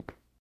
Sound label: foot tile; Zomboid 41.78.16; Project Zomboid